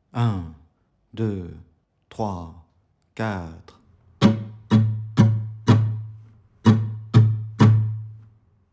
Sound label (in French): exercice 060bpm 1 mesure